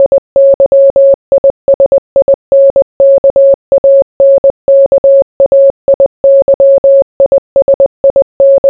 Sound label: morse; question